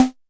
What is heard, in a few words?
mbrute prc, Arturia MiniBrute; DRUM MACHINES